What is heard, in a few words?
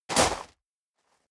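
step leather shoes gravel medium jump, footsteps; schritte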